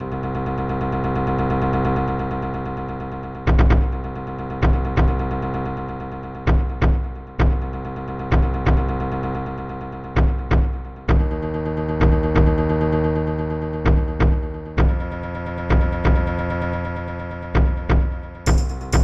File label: Suspense; Hero of Law